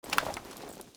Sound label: gauss draw